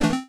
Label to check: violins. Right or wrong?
Wrong